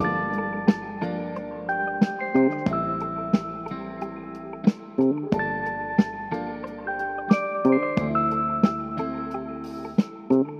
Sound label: keys, phonky loops